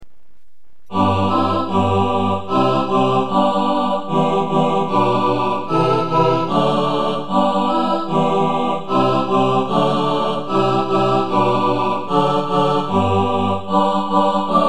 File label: satb, choir